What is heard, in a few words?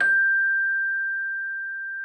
Celesta G6; Xsample VOL-8